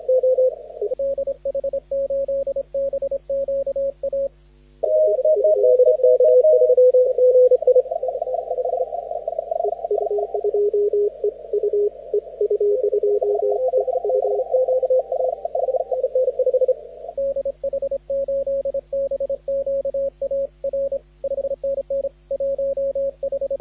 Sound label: CW 18MHz; K1TO; CQWWCW